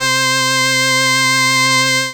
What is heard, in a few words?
synth